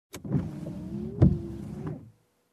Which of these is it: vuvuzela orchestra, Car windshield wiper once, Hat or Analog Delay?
Car windshield wiper once